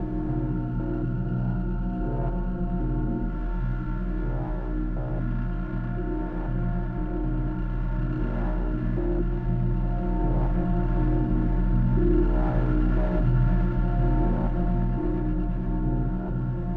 sounds music; Halo Combat Evolved; Xbox

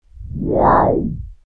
globe spin mono; dokobots

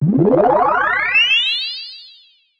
Sparkle Laser

